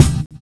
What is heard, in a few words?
kick, Drum and Bass; EZ Rollers; Instrument Samples